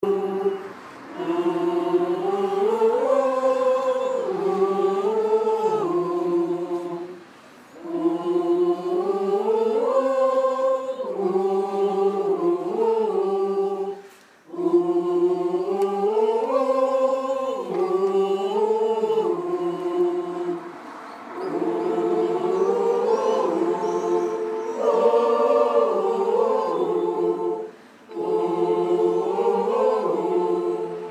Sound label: suo gan tune; outside